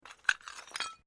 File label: Glass move quiet; Material components for constructing more complex sounds; Materials